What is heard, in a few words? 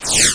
Zoom Down; SysError; SysErr Animation